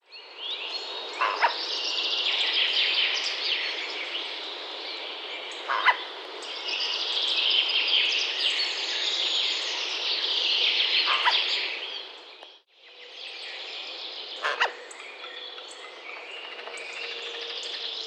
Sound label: Garrulus glandarius; SETE